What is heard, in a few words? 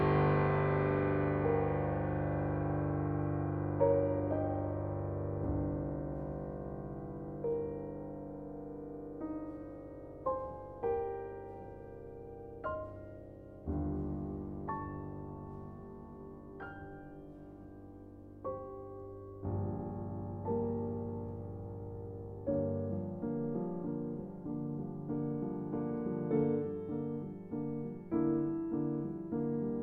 Ballad